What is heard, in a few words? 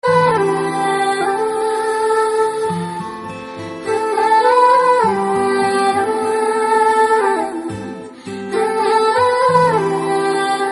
sweet voice